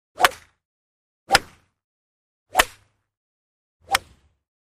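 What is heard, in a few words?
Golf Swing Driver Wood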